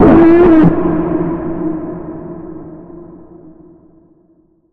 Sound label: Hard To Bend, E